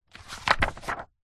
household book manual large page turn; zapsplat

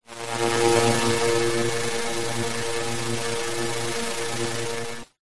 sound design electricity arc; zapsplat

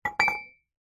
household cup mug set down on concrete; zapsplat

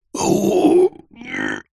human male man strain grunt

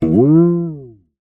cartoon twang bounce boing spring

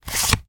medieval seax draw from sheath fast; still north media

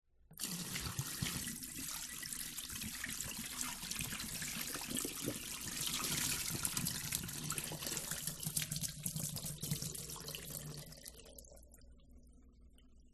water pouring; sound spark

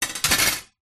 clothes hanger metal wire set down on glass table; household

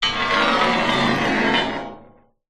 metal scrape on metal bar strip

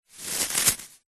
leisure hacky sack squeeze crunch

